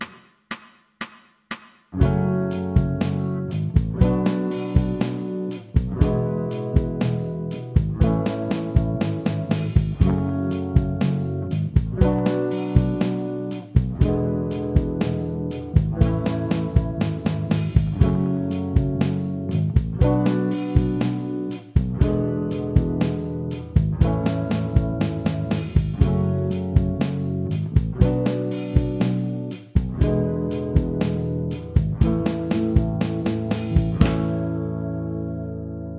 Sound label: one note backing track